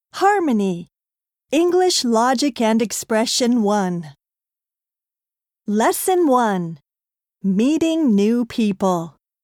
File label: harmony; Harmony English Logic and Expression Ⅰ; 新課程英語教科書 指導用音声CD